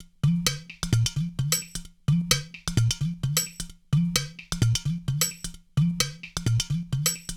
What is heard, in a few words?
Potdrm Loop 130; Equipped Music; Loungin House; Perc Loops 120